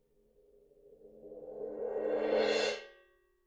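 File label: suspended cymbal roll; パーカッション; サンプリング素材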